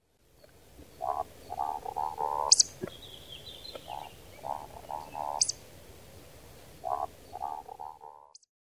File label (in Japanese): yamashigi, doto